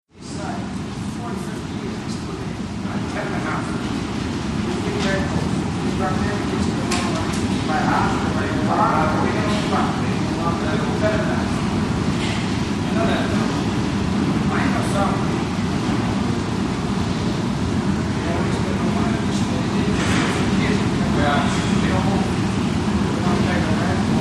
BOATS & SHIPS STATEN ISLAND FERRY: EXT: Walla, passenger lounge, ambiance with engine in background.